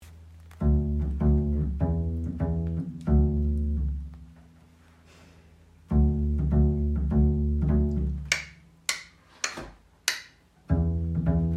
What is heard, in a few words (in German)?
Zeigefingertanz Bass pizz; pizzicato - gezupft